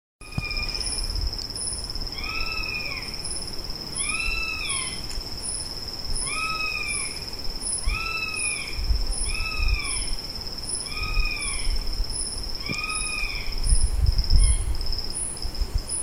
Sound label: Spizaetus isidori, roofvogels; Spitskuifarend; Accipitrinae; Accipitres; Accipitidae